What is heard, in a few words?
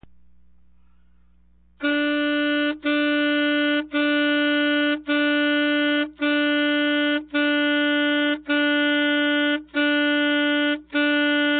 attack, sax